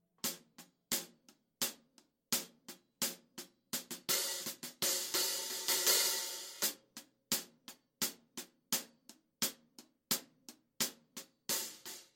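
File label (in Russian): отрывок из саундчека тарелки барабанные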